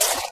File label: gravel footstep